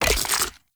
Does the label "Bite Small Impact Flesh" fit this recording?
Yes